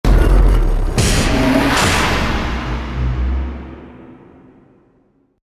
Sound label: hit complex long